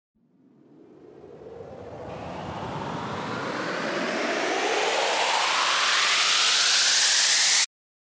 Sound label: RISER